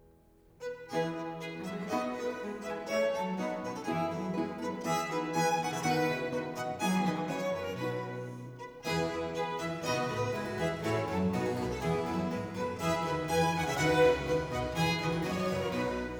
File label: Concerto grosso Gavotta (Allegro); No. 9 in F Major; Corelli; Concerti grossi, Op. 6